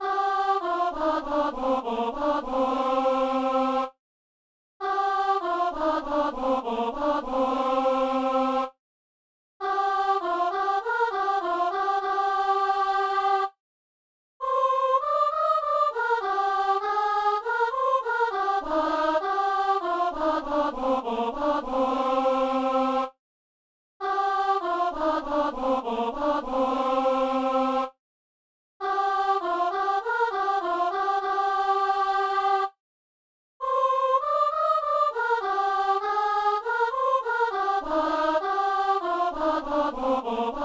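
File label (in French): assemblée; Chante alleluia au Seigneur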